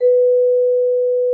VIBES; Xsample VOL-4

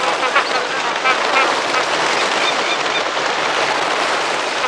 GEESE, ESTUARY; Zero-G Ambient 1

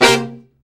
BRA SECT; BRA SECTIONS

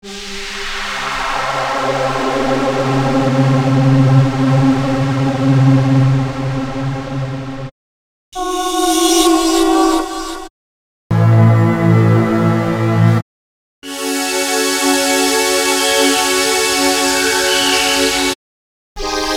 breaks, Jungle; Zero-G Jungle Frenzy 1

